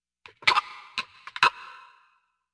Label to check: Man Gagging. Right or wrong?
Right